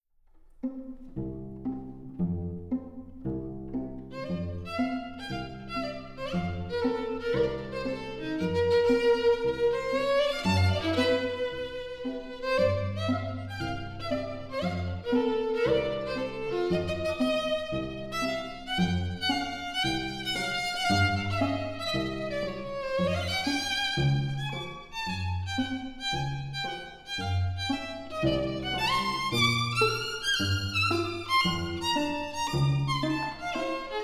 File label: String Quartet No. 5 Op. 27 II. Humoreska; Weinberg